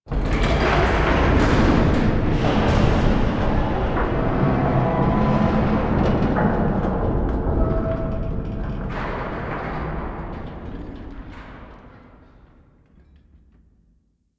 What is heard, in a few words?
Straircase Machinery Stinger; Warframe; Ambience; Entrati